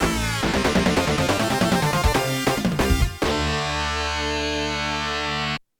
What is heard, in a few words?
Objective Clear; Exranza; The 16bit Audiophile Project; Genesis gamerip 1993